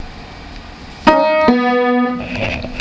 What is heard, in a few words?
Ding Dong